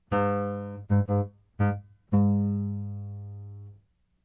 piano pitch flatten

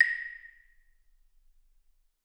clave; dsp2008 sound library

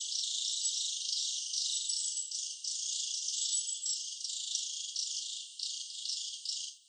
tree Runner trill

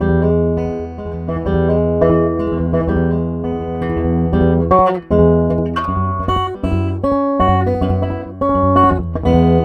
guitar through banjo minus piezo

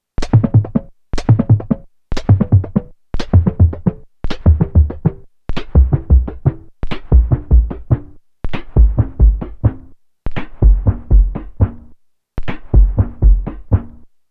kit detune

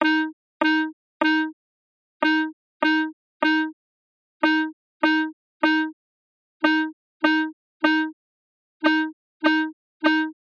Clarinet vs Trumpet

